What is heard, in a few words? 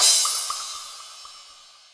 normal hit finish; osu!